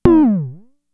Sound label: FX, Drum Machines; Fricke Schlagzwerg